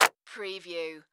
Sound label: Clap Drum Sample